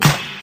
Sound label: raw snare single hit D key, steel snare drum tuned to the D note